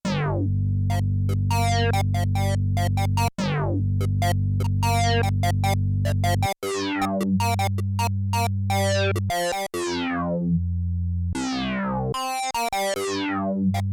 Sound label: Single VCF Brass, Prophet 5; Yamaha TX16W